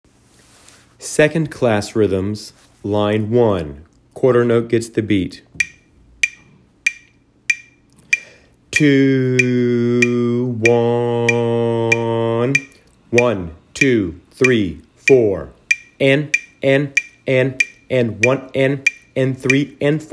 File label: rhythms